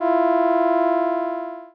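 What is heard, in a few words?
sax minor second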